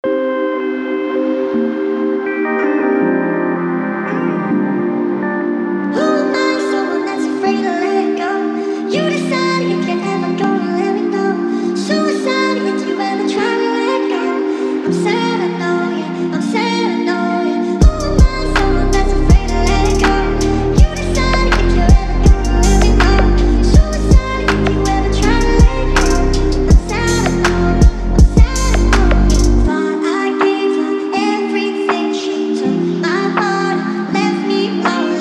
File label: sad speed up; pop